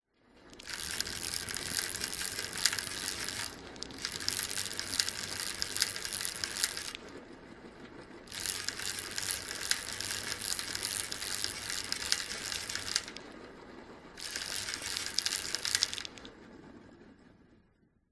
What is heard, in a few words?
bicycle pushbike wheel pedal fast stop start freewheel; vehicles; zapsplat